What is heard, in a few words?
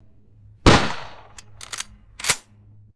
single close up pistol gunshot